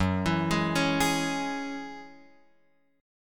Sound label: Gb 7th Suspended 2nd Gb down Guitar Open F; arpeggiated; JGuitar